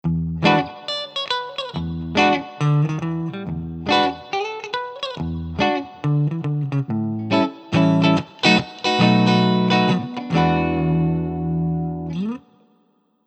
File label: Bridge Neck clean